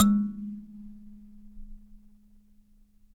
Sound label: Dyna Sansa; Roland LCDP11 Africa VOL-1; Sansas